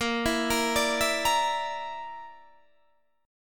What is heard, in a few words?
Bb Major Flat 5th Bb down Guitar DADGAD, chord